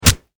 Impact Punch Face Meaty Flesh; Punches and Hits; Combat

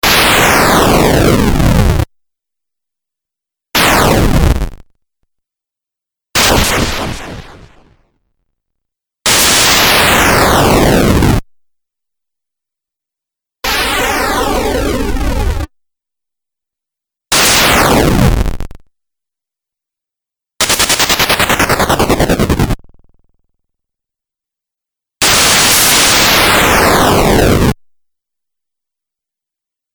Fireball Video Game; Video Game Sound Effects

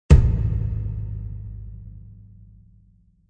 Impact Mid Tom; Impact hit for music production, video editing, movements, logos, flash, or animation

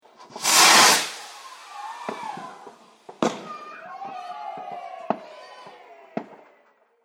Fireworks Rocket Whoosh And Bangs With Cheers; Firework Sound Effects; Rocket Whoosh And Background Bangs; Andrew Fleming

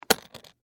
Case Latch Open, Foley, Case, Latch, Open; Latches; Objects; Blast Wave

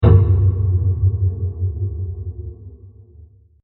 Dark Metal Impact; Blasts; Impacts; Crafted Signals

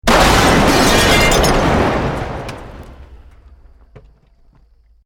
Building Collapse Medium; Created dramatic effects of buildings collapsing or breaking; Construction; SoundEffects-Foundation1

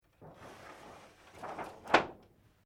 Window Screen Sliding Paper Japanese Close

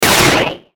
Hit Fast Digital Warble Distortion; Blastwave

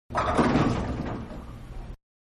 Bowling-Strike Only-Very Far Away; Bowling (Sound Effects:Sports); Manhattan Production Music

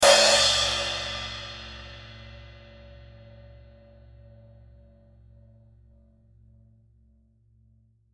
Thin Crash Cymbal, Thin Crash Cymbal 14 Inch; Musical; Blastwave

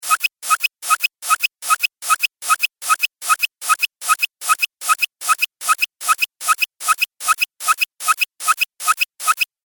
Cartoon Repetitive Pump; Cartoon Sound Design Sound Effects

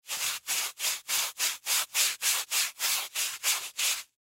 Sand Paper Rub Grind Scrape; Sander; Industry; Sanders; Sound Effects:Machines; Blastwave

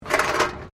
METAL BINLID SCRAPE WIRE FENCE; Metal (Sound Effects:Materials)

